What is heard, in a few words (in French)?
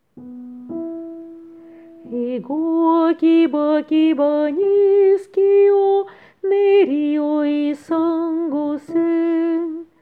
Hommes